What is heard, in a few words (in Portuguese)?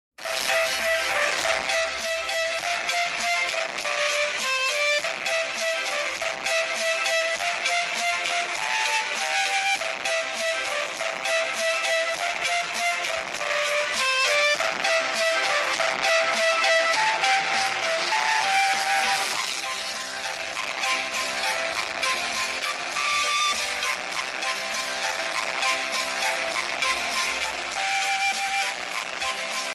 musica do pou estourada